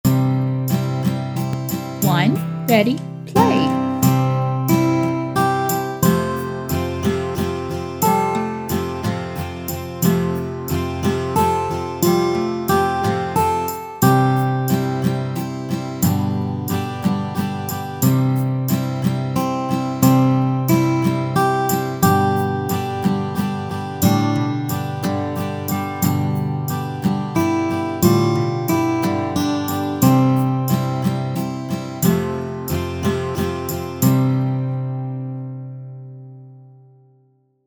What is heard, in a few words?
On Top of Old Smokey SLOW Tempo WITH INTRO, ukulele; Rainbow Ukulele Play-Along